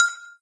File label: Combo Sound; candy crush saga gamerip